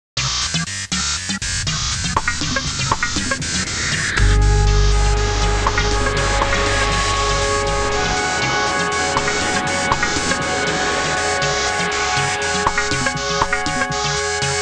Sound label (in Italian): musica elettronica; electronic